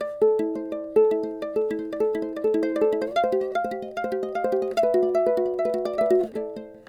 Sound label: LOOP Ukulele Stereo